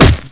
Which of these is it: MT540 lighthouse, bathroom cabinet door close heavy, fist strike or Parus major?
fist strike